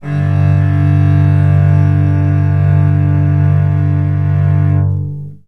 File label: fifth chord, cello